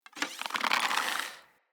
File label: Hunter Bow Ranged Charge; Weapons; Player; Bows; EnvoyHunterBowRangedMelee